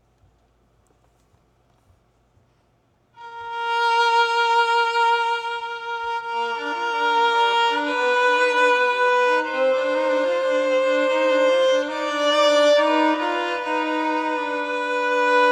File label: Violin Duet for Dancer